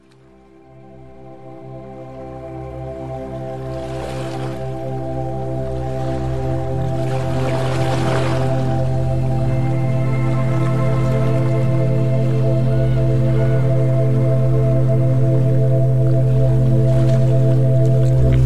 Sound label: Brainwave Entrainment 7,81 Hz